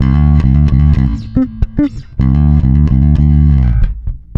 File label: NASTY D, bass; Spectrasonics; Bass Legends